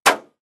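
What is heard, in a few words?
Metal Solid Impact Bullet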